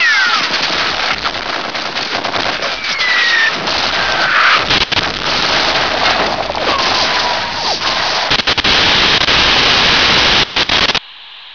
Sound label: radio